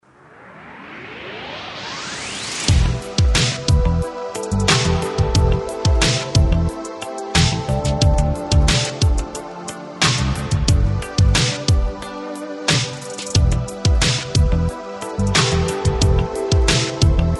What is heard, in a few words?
rhythm blues